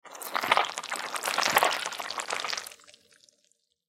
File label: Vampire Suck Blood Creature Bite Squish Squeeze; Monster Sound Effects; Horror; Blastwave